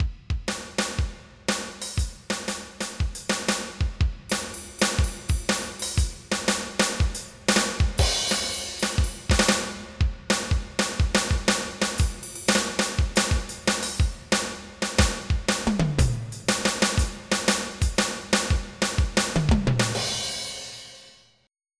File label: blues rock